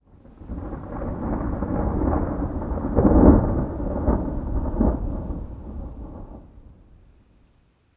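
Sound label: thunder distant; ambient soundscapes; dota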